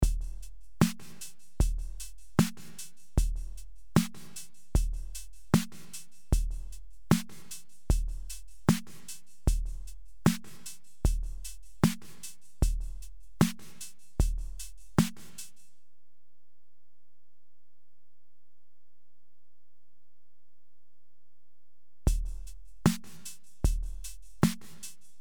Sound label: drum